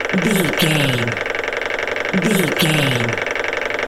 engine ticking hum generator small loop

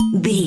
bell small muted

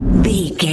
whoosh slow deep

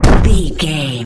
rock impact heavy slam

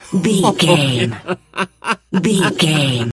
voice male laugh high short

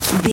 footstep snow run

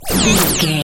sci-fi power down

